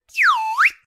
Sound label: twee; dyslexia